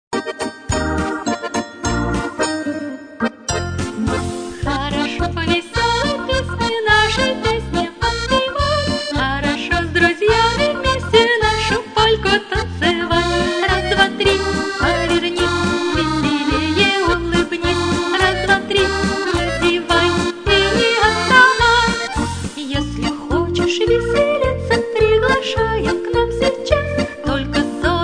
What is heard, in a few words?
polka; slushanie dlya detej ot 5 ti do 6 ti let